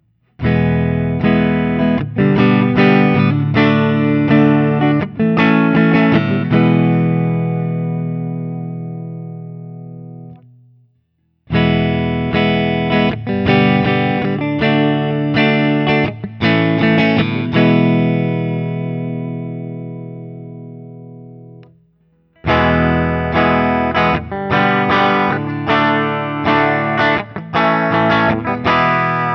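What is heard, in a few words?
Open, guitar; Guild Newark St X175 Manhattan Special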